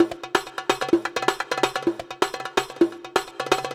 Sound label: Percussive Loops 128BPM; Vengeance Samples